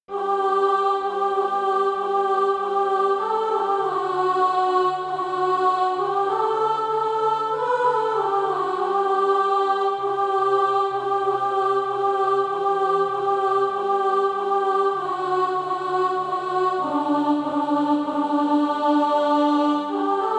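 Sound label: alto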